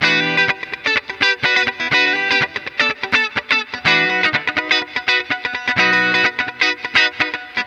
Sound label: Disco Fever; LICK; Best Service ProSamples vol.48 - Disco Fever; AKAI